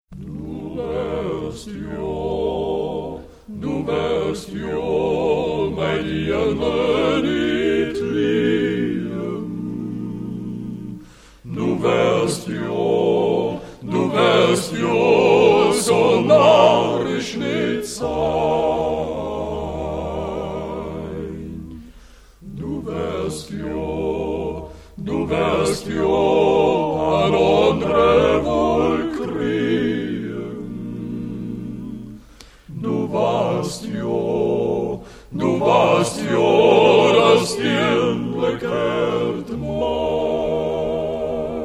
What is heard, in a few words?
quartette